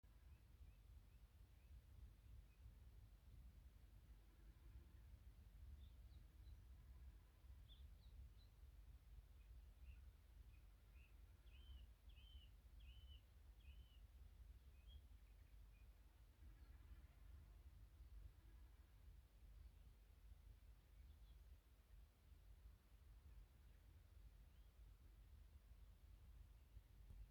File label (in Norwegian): dobbeltbekkasin; holsen; tremarka